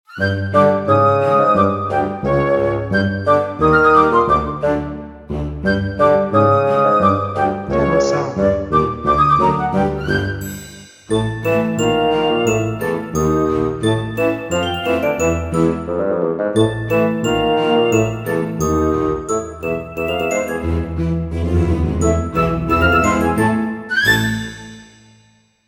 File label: Flute